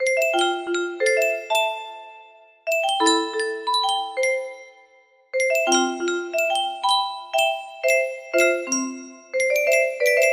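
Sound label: music box melody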